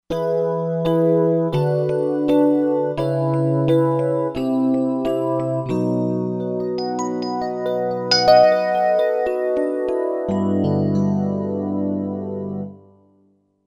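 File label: Piano Chorus Bell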